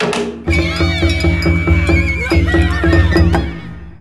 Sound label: kabuki taiko; Yo-kai Watch Busters Akanekodan